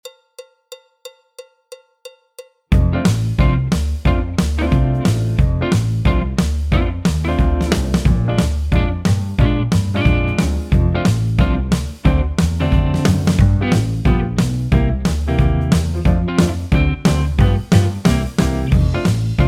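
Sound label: backing track, Jazz; alto, trombone, piano; Jazz Syllabus Level 2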